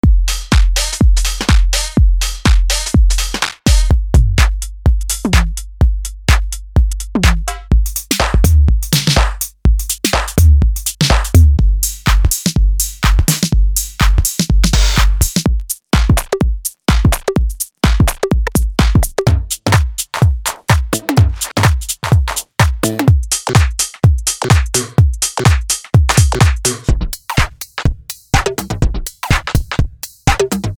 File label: drumloop